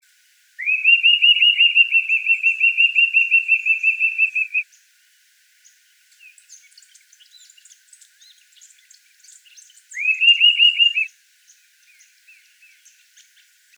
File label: Sirystes sibilator; PP Mocona; Misiones; Pablo Meoniz